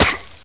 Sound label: pain, player; hl2